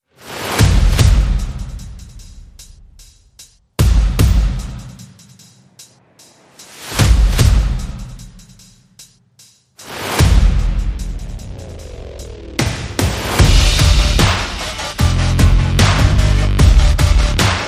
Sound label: Drum And Bass Mix